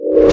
Sci Fi Door

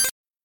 Computer Beep

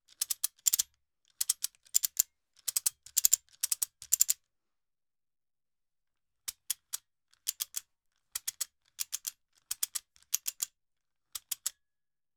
Ratchet Belt Click Sound

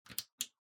Suction Hood Button

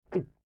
Nervous Male Gulp

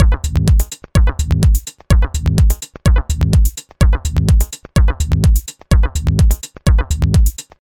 126 Minloop Dm

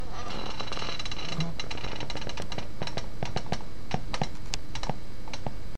Creaking Chair

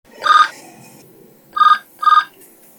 Fax Machine Ring

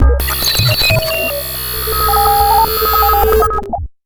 Futuretro computing

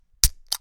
Cracking A Can; Red Bull

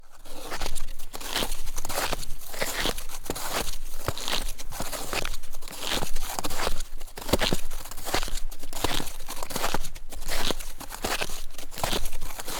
Snowshoe Walking

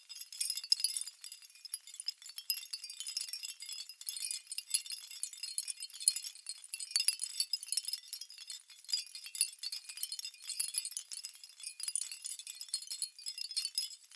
Shells Tinkle

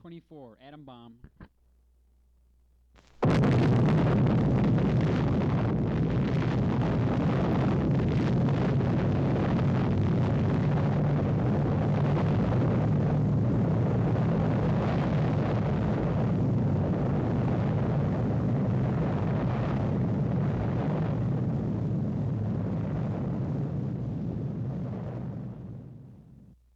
Atomic Bomb

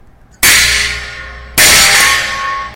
Metallic Plate Fall

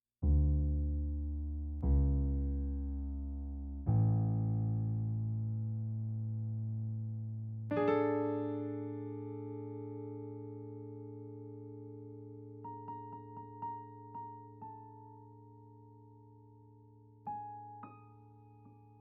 Piano Recording

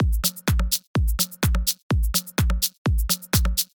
Drum loop

